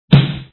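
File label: snare drum; snaredrm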